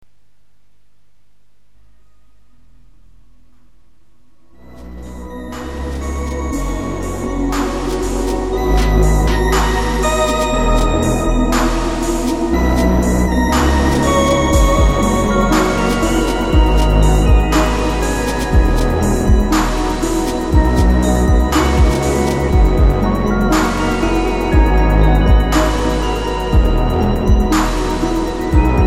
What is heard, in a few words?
blues